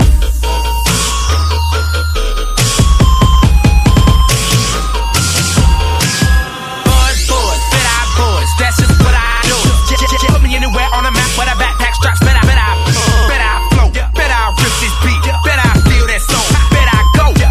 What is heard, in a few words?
Remix